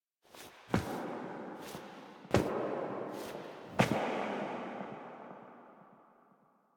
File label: Operator Steps, Foley; Apostasy Cinematic